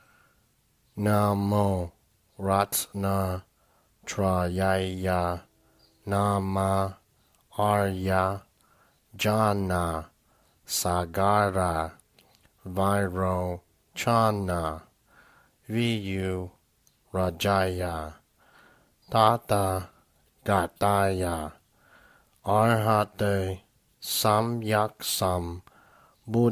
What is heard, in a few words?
Great Compassion Mantra Tibetan